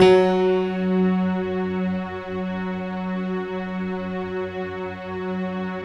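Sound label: bright electric piano strings; Optical Media International - Sonic Images Library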